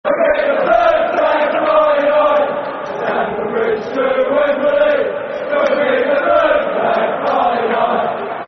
Keep The Blue Flag Flying High, Football Chants (Soccer Song); Chelsea Cfc